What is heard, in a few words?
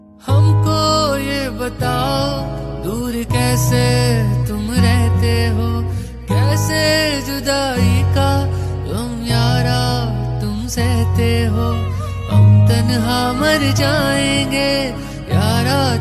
Sad Song